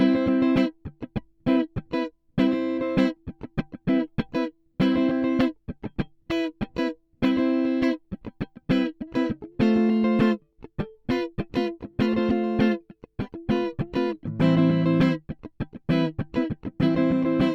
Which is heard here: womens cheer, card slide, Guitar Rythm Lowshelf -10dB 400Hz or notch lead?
Guitar Rythm Lowshelf -10dB 400Hz